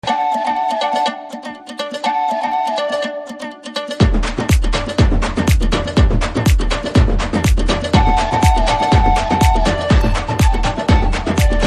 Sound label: BGM Ringtone; Republic